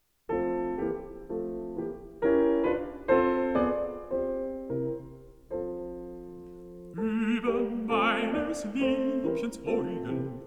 song for voice & piano

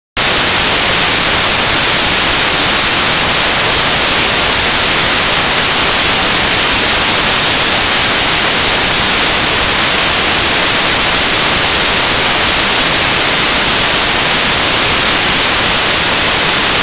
White Noise 0db L+r; m-Sequence Dither; Denon Audio Technical CD

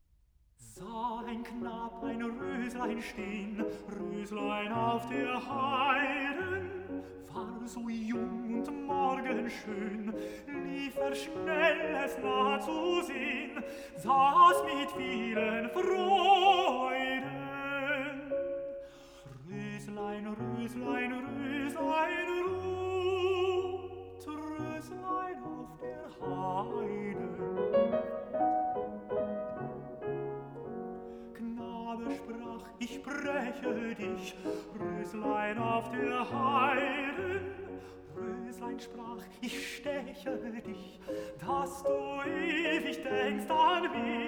song for voice & piano; Schubert

